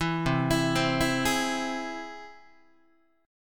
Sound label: C Augmented down Guitar; arpeggiated; JGuitar